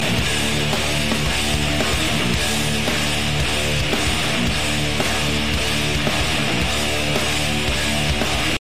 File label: loop mosh